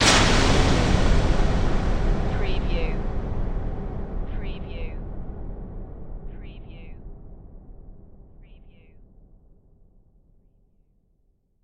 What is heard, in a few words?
Hit Slam